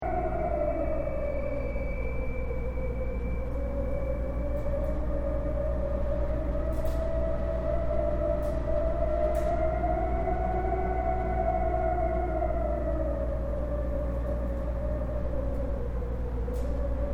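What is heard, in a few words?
AMBIENCE WIND AND RESONANCE THROUGH APARTMENT BUILDING LOOP; Regional Ambience; Weather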